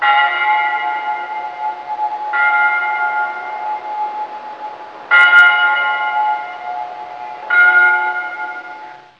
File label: church bells